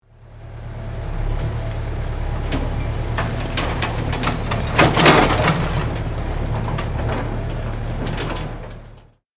Excavator construction vehicle bucket dump

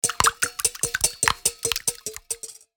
Steel whisk egg beating; household

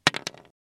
Dropping chalk stick

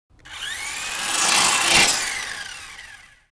RC toy car remote controlled passing by fast; rc toys

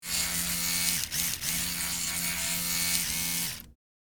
Electric toothbrush servo motor running; Household Appliances

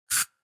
Spray deodorant

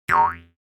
Cartoon spring boing jaw harp

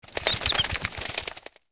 Bat squeak chirping close perspective

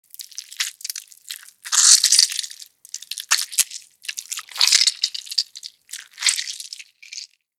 Snake eating devouring prey

